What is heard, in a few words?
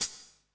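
closed hi hat, 150 BPM; Drum & Bass; Ueberschall; Drum & Bass Resonance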